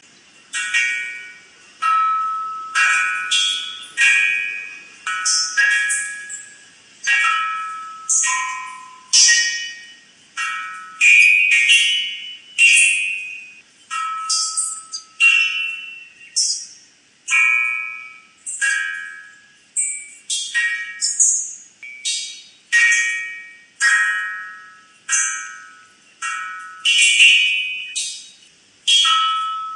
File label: harp